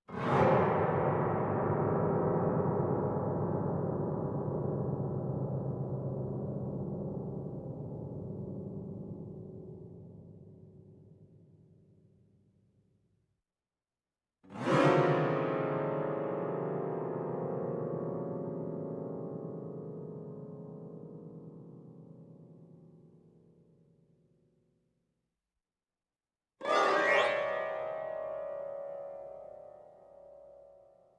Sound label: Piano LOW Gliss Suspense; Electa Quinney